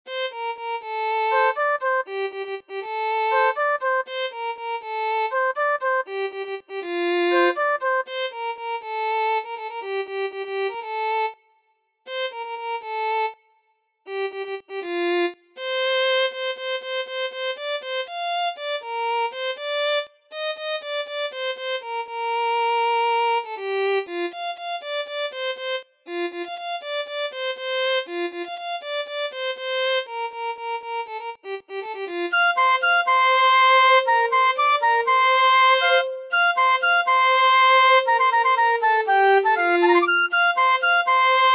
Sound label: Violin